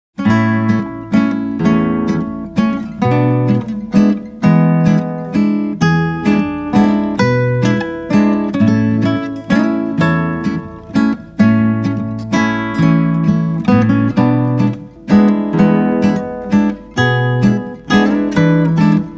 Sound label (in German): Walzer Landler Walzer